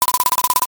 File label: lever